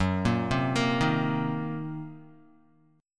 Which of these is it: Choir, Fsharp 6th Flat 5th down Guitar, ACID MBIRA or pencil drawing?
Fsharp 6th Flat 5th down Guitar